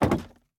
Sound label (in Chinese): 竹木门开关声